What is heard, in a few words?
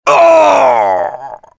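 soldier pain critical death; voice packs; soldier nukem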